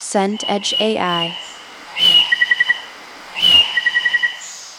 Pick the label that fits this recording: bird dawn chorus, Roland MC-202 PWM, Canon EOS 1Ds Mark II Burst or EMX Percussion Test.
bird dawn chorus